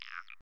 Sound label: Creatuer